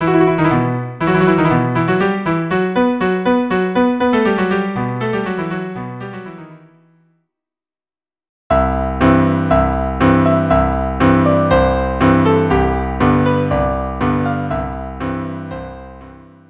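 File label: viola cello duo; Anvil Chorus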